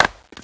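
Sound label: wood chop; Total Chaos Nukem 3d Pack; duke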